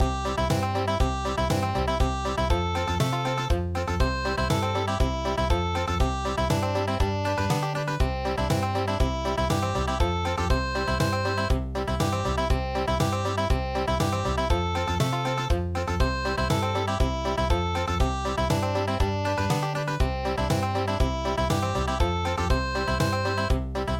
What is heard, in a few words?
Country; good bye